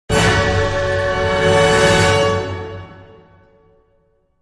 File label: battle suspense